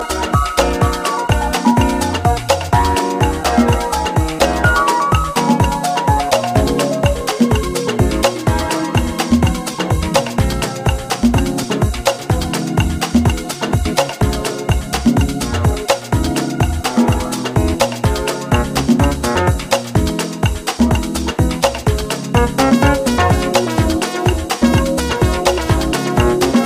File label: Inst